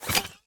zombie step; smite; ahpuch skin10

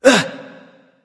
surprised; smite; loki

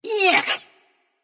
"ugh"; smite; janus

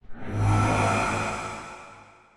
nightmare glide; smite; thanatos

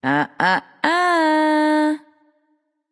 nv disagree; smite; awilix

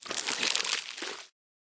Tentacles; smite; god bakasura

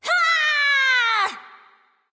battle cry; smite

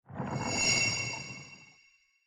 recall ohm leadin; smite; god ganesha skin11

